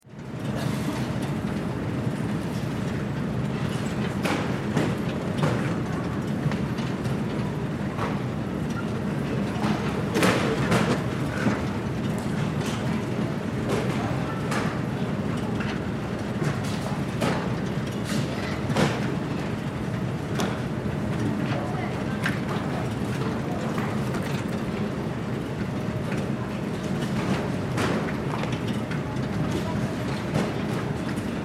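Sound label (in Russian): звуки багажной карусели в аэропорту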